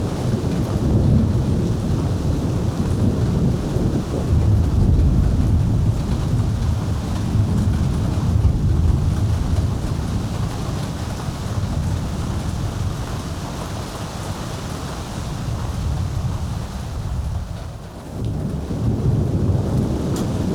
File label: Wind and Storms